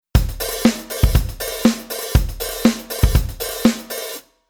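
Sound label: Rhythm 16beat Hi-Hat Open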